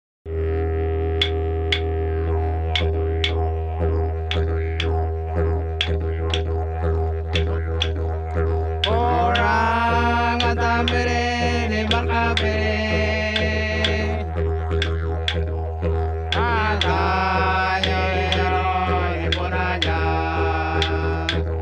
纪录片背景音乐

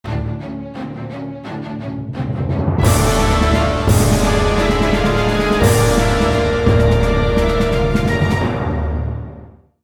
大气的背景音乐

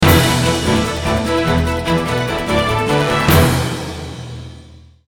电视包装音乐

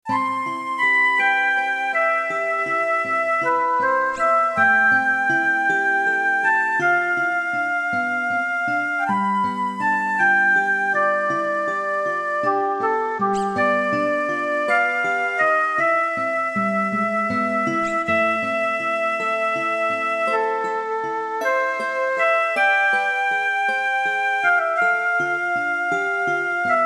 片头音乐

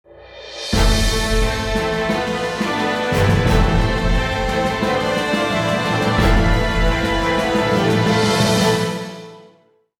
大气的背景音乐

